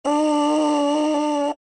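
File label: trombone mouthpiece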